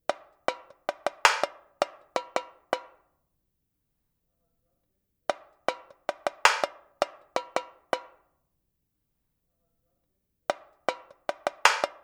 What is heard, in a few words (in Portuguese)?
TAMBORIM DE SAMBA